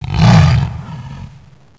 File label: hunter; pain